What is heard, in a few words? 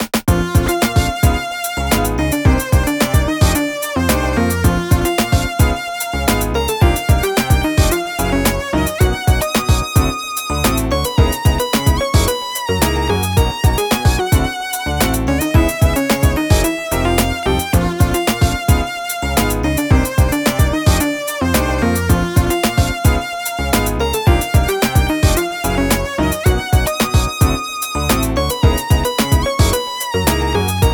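BGM Light Board Result Draw; Clubhouse Games: 51 Worldwide Classics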